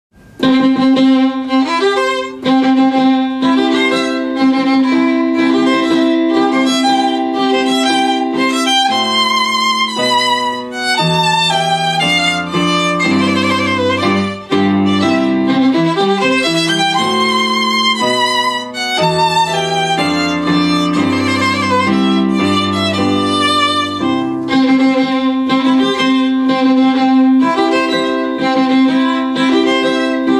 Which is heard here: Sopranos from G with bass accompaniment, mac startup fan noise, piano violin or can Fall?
piano violin